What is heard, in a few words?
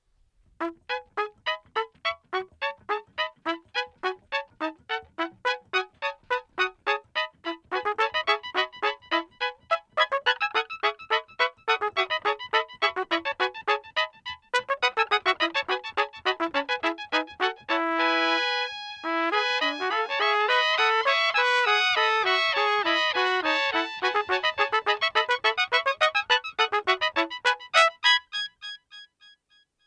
Delayed Harmony (E major); Pitchfactor; harmonizers; Trumpet; Eventide Pitchfactor; Pitch Manipulation Pedals